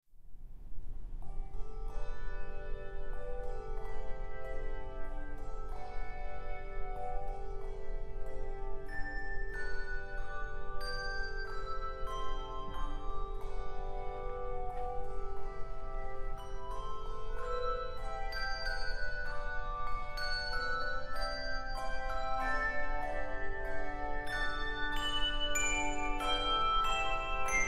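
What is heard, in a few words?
Handbell Music, Handbells Solo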